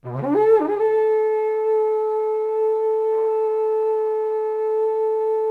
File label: Kudu Horn 3 E3; Civilization Revolution